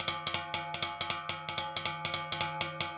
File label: 080 metal; Zero-G; Monster Beats